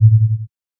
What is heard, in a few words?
smash; noteye; roguelike